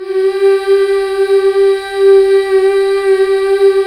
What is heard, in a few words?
MMHH, BACK. MMMHH Fem